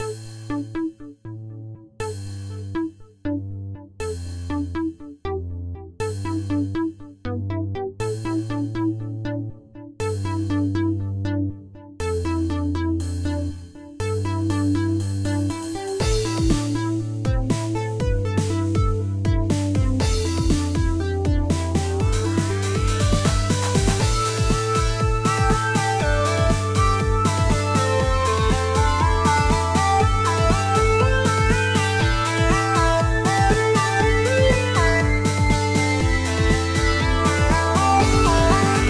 vacuum, BGM; Techmino

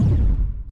emit, chiptune; Techmino

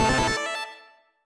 spawn

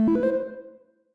spin; Techmino

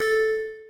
blip

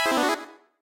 spawn; Techmino

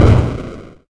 fall

